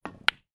pool snooker cue ball hit strike other recorded from other ball